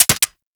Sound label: Lever Action Rifle Mech, Weapons; Warframe; Tenno